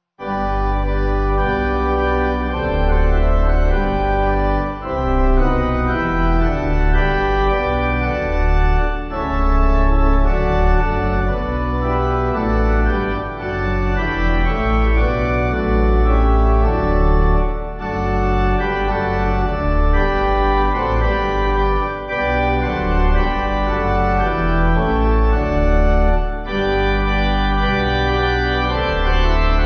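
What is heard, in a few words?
Pipe, pipe organ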